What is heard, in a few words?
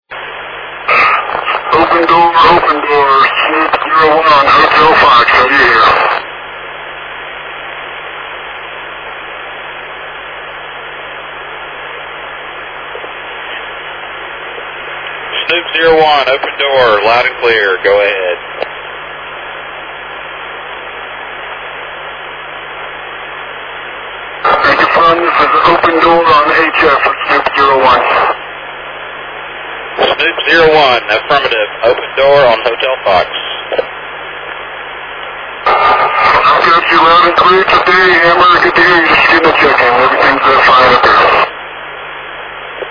open door